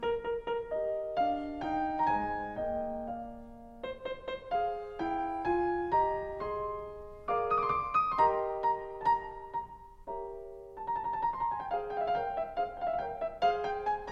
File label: Concerto for 2 Pianos E flat major Allegro; Mozart; No10; K365